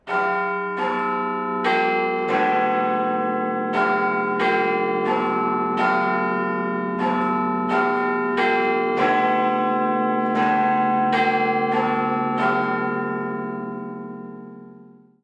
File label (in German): big ben klingelton glockenspiel